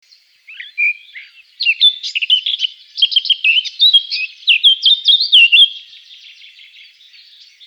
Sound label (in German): Singvoegel Moenchsgrasmuecke